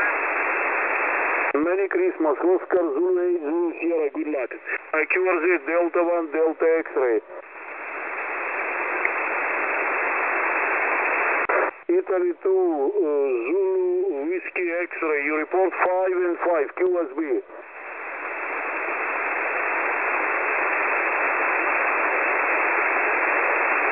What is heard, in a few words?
14244.0kHz; ham